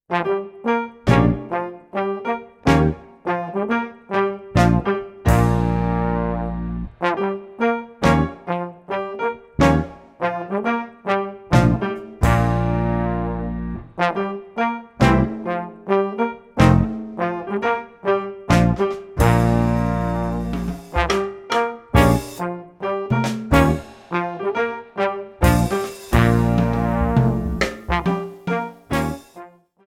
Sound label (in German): Blues For Cool Cats, Jazz Club Posaune